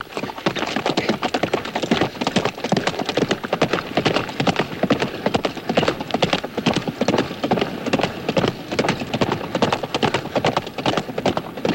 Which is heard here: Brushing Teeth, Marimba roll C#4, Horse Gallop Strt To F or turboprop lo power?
Horse Gallop Strt To F